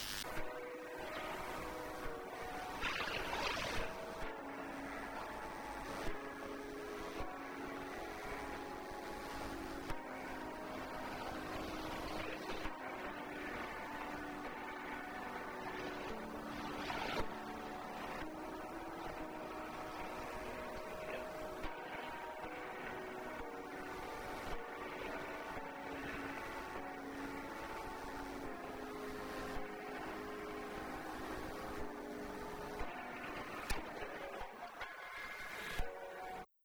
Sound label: Piano Sketch